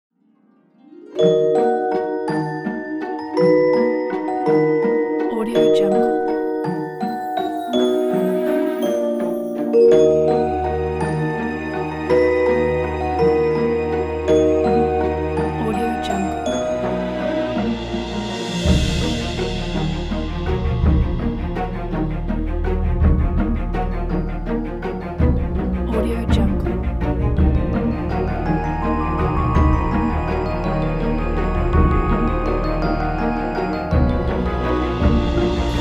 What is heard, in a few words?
magic mysterious fairy tale